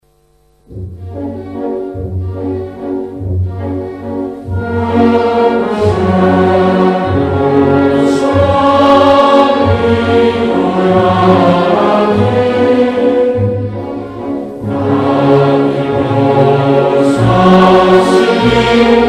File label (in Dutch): Slavenkoor; Nabucco – G. Verdi